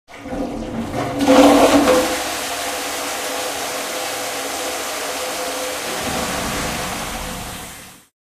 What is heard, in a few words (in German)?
Klo